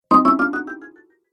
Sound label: App Delay, ping; multimedia